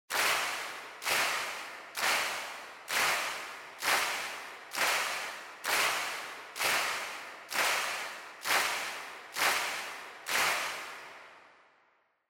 Handclap Far Fast

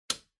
Wall Outlet 2 Pin Unplug

